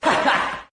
ha ha; Voice; ooh ahh

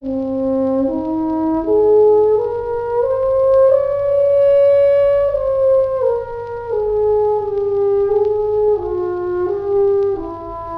horns, Sad

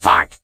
fart, Voice; zorak

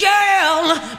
"girl" sung; Voice; expletives

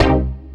korg, Synths; Notes

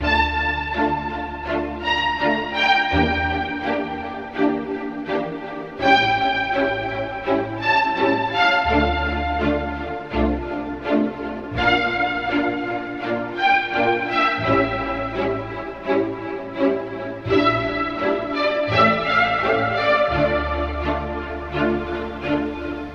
strings the slow burn, Sad; Loops

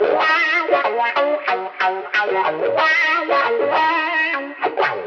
guitar, Phonky loops

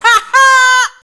ha ha

